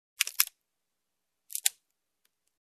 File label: Little Branch crackles